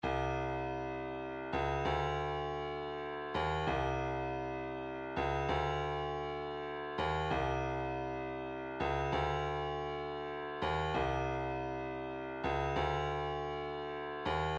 kick, midi

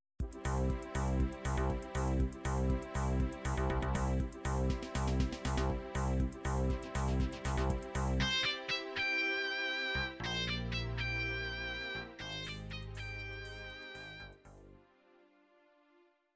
techno, midi

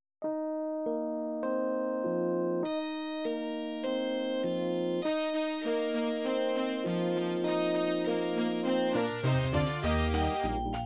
oldies 1950, midi

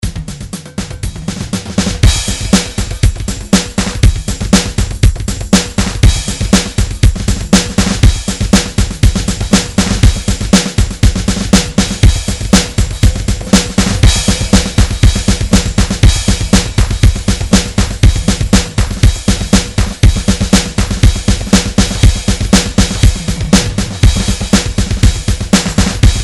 drum grooves midi loops; Nice Beats 1000; top drum

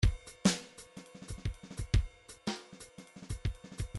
midi grooves bebop; Bdf Midi File Grooves

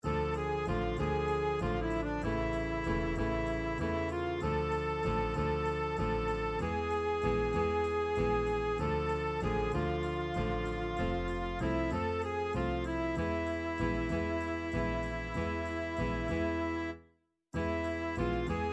folk bulgaria hora, midi; blaguno dejche pozaranche

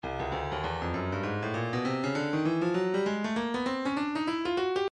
stylus breakbeat midi 099 bpm

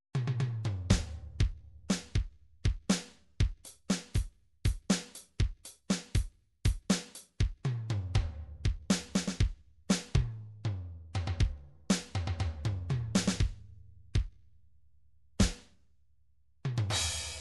drums rock, midi drums

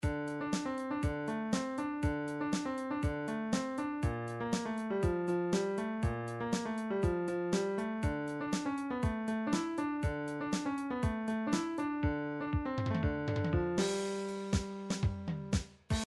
funk, midi

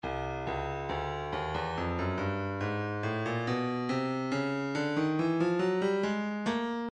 breakbeat 070 bpm; intimate moment h; Stylus Breakbeat Midi Files

